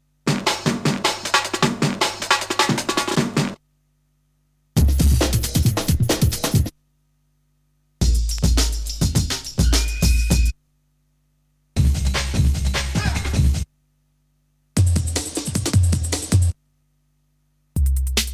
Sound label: Breakbeats; Kniteforce; 1000 Samples Volume 1